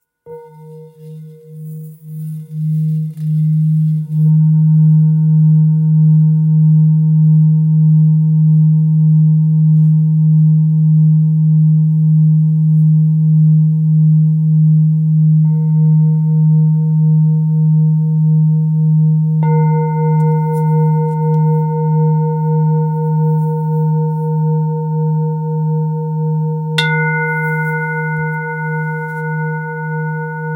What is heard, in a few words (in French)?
Bol Thick re diese